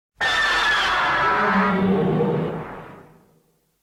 roar; spacegodzilla

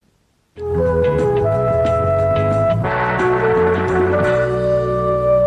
jingle; aktuelle kamera

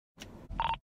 police radio; police radio call

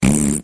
fart

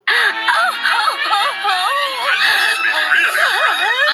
crying

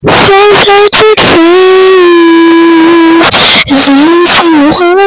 jump fart; fnaf 10

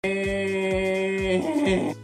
ehhhhhhhhhh

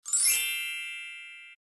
sparkle

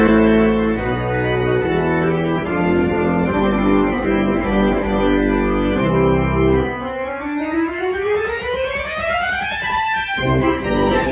piano transition

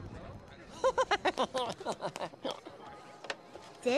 laugh; stitch

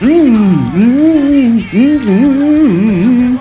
mmmmmmmm

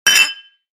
cheering glasses

